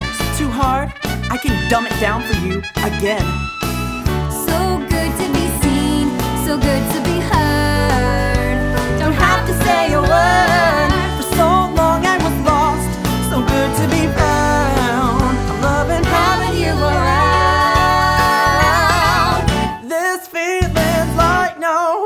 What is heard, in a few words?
Vocal